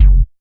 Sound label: RESO KICK; Kick 1